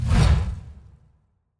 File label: Catapult Bones Launch